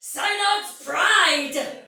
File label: Pre Death Soldier Female, Battle; Dialog; Sinecure